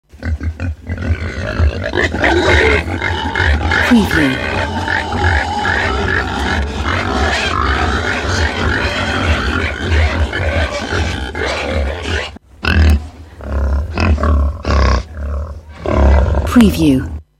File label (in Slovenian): EFEKT PUJSKI